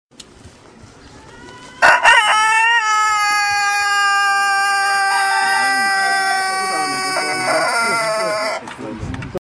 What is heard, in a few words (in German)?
hahn, Gefluegel